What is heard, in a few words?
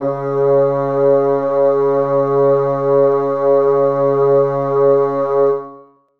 bassoons sus c#3; Sonatina Symphonic Orchestra